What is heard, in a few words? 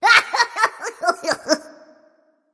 laugh; Ratatoskr; Smite